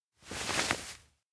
sleeve pull back; anemoi

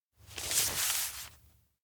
map point; anemoi パッケージ版

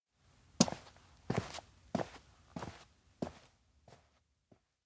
steps outdoor road walk; anemoi

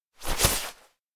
goal; anemoi

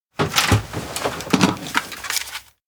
pick broadcast room item; anemoi

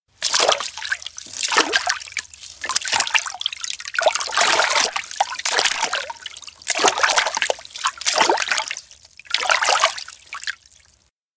steps outdoor blind walk wobble; aino; anemoi

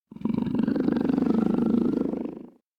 stomach growl; kurumi; anemoi

